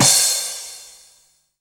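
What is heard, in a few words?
D2 crash, crash reverse; Best Service Dance Mega Drums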